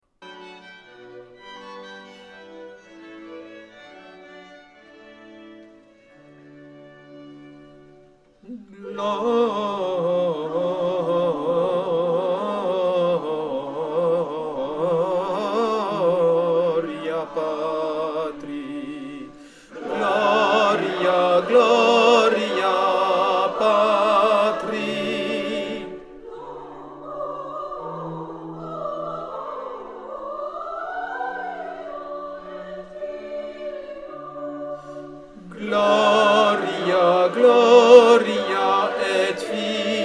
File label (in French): alti, choeur